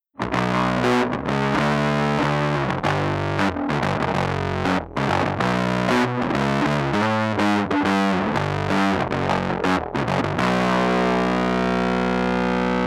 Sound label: BIG MUFF